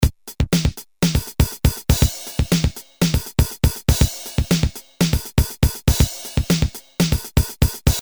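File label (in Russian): барабанный луп быстрый ритмичный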